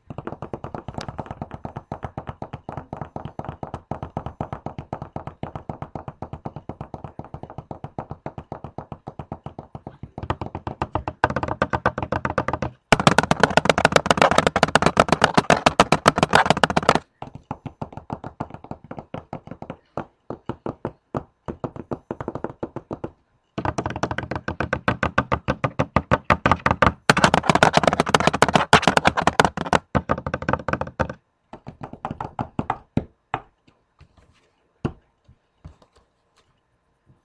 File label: tapping on all things